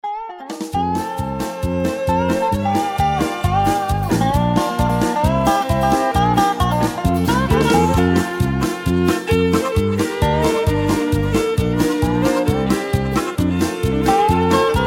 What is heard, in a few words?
Instrumental